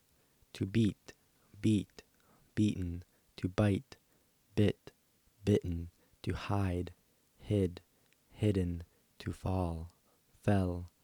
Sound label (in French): beat